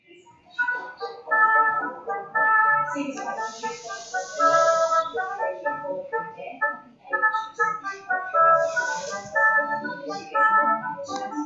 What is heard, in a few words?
debka, folk instrumental